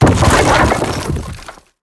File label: objects, World of Tanks